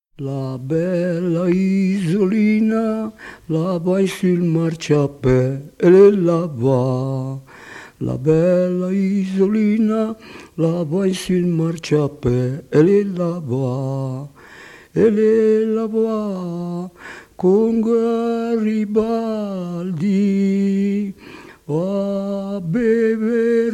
la bella Isolina; brianza

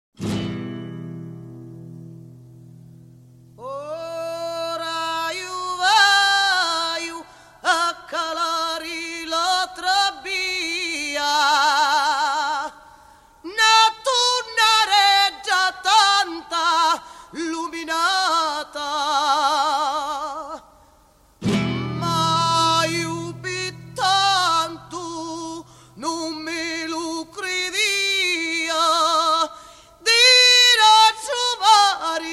Canto di pesca

